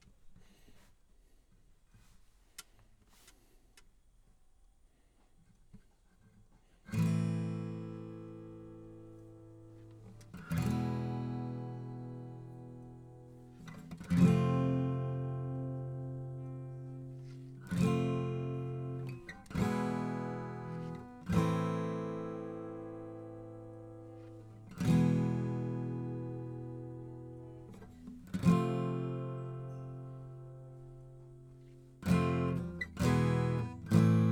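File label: Acoustic Guitar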